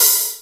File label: Roland R-8 Hat, Drums